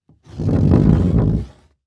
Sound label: Foley Footstep Cowboy Boots Scrape On Hollow Wood Surface Low; Foley Footsteps